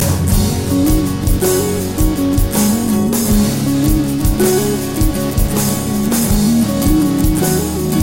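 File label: instrumental; Jazz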